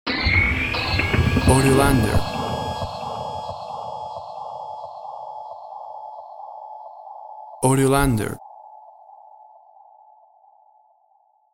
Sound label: Witches Cauldrun Mid